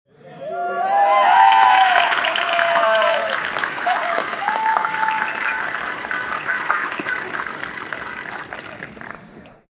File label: sound of rum pouring into; beverage